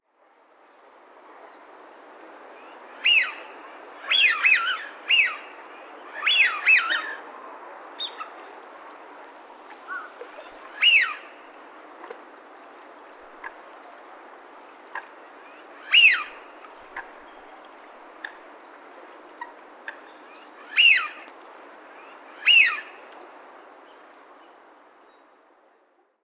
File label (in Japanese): ヒドリガモ; 草加